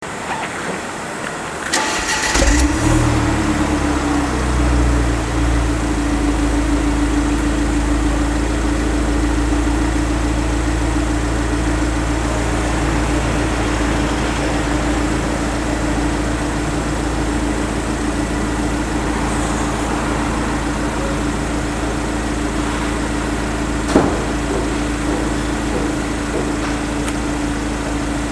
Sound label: mufler; Giulietta; unicorse-italial